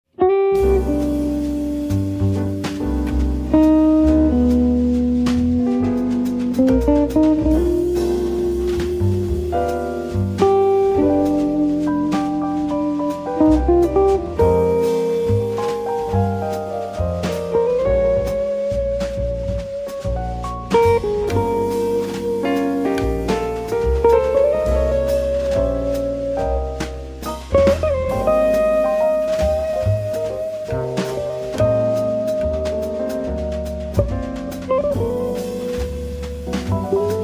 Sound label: Quartet